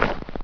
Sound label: Henchman Feet Snow; Playable Tutorial Level; TR2